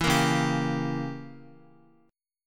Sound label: Db Diminished 7th Db down Guitar; Dbdim7 strummed